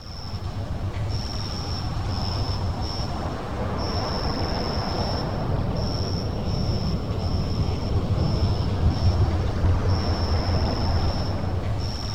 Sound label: ATMOSFX; Best Service; Advanced Mega Effects